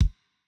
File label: kick; Reference Beat BPM120; j city pop 1980